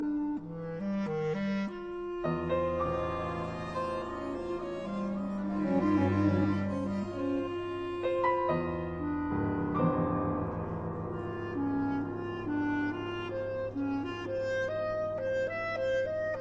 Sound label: Quartet for Clarinet Violin Cello and Piano Moderato; Stanzas